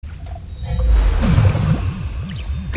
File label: root arround node; kena bridge of spirits